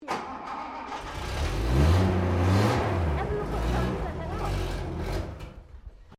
CAR HOLDEN FE IGNITION FAIL, Classic Australian cars; Car Holden Fe 1957; Cars (Sound Effects:Vehicles)